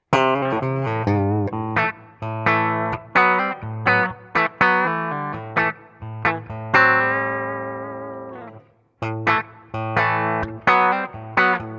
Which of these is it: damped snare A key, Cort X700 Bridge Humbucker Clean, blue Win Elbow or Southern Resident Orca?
Cort X700 Bridge Humbucker Clean